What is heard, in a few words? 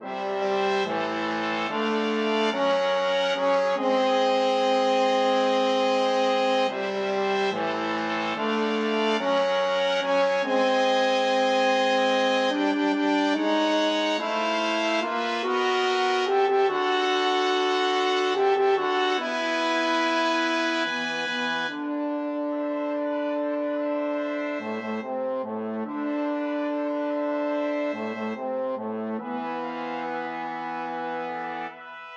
fanfare